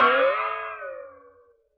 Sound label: BOX FX; Univers Sons - Raricussions